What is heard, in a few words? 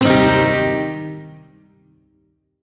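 Shawzin Minor Chord; Warframe; Duviri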